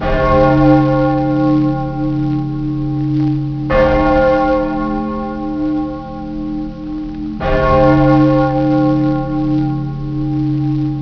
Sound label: Church Bells; horror; horrorgifs